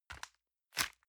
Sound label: envelope grab release medium